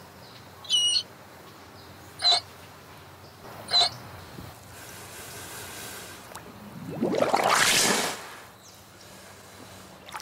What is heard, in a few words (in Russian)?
lamantin